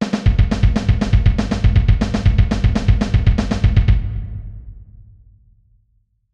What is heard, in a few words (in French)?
Coordination 120bpm